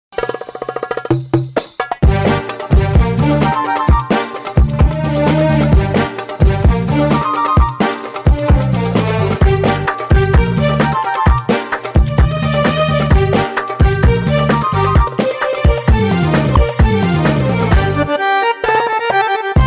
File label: waltz